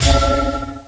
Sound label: Keyboard Up; ESAN VO Sound Schemes; ESAN MK Sound Packs